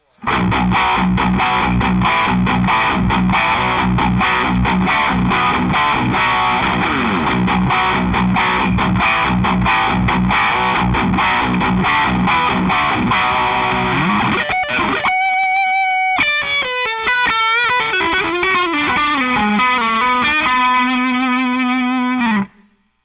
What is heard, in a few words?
rock; Eminence Legend EM12N; Bluesy Strat